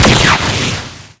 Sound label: SFX Shoot; Skylanders Cloud Patrol; Arcade, iOS, Mobile; Ninjini